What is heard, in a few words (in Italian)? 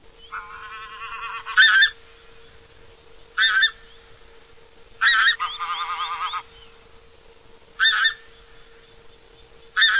OCA GRANAIOLA